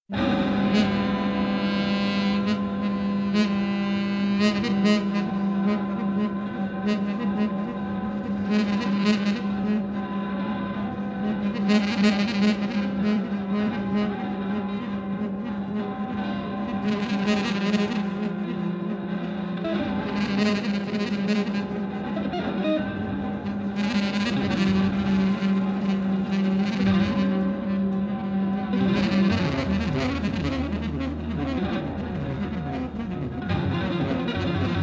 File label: Soft doors close